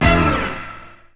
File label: DISCO FX; Zero-G; Funky Elements